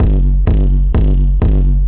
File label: dark industrial kick techno; drum loops